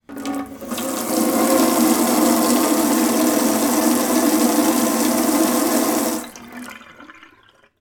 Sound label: household water from tap into metal sink fast short